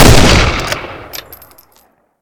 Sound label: rifle; ML-Agents; FPS Game